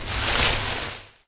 objects, World of Tanks

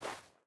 footstep burlap snow walk; Rust

